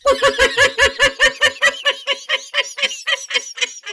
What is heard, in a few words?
High Pitched Creepy Laughing